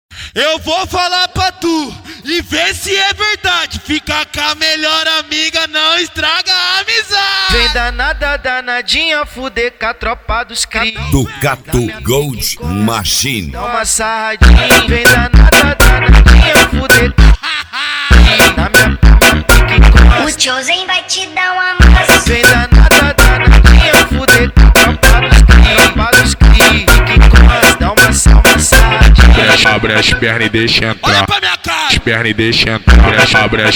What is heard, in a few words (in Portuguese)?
especial funk